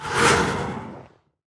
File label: spawn unit; tower; grim wraiths